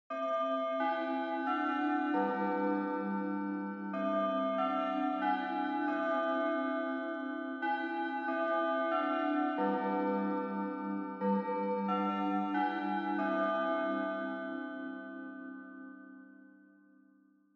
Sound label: Japanese School Bell Far Fast Long